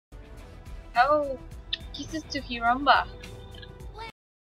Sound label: kisses